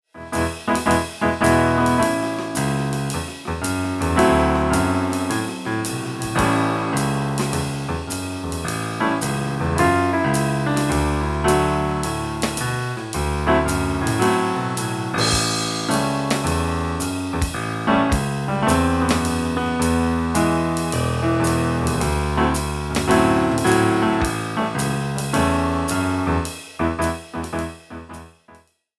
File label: rubber baby improv